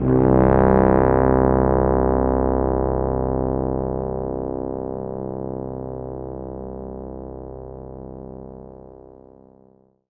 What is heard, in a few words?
C2, Synth Line; 90 s Sample CDs; NiceBeats; SynthLine VOL-24